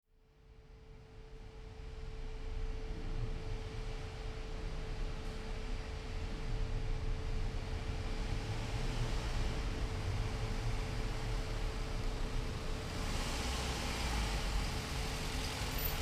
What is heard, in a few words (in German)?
autos beim uberfahren von bodenwellen in der tiefgarage